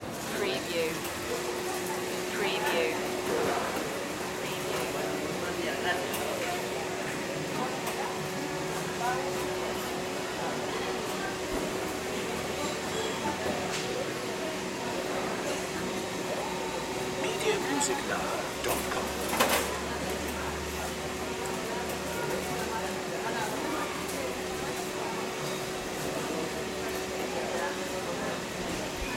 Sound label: indoor market colombia; latin; the sound pack tree